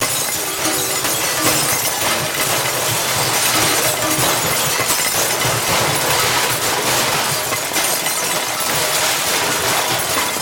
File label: Long Junk Crash; Action, Impacts & Explosions